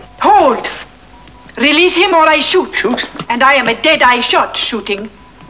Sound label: shoot